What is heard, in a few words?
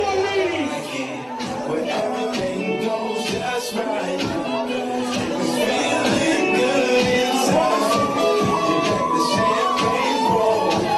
music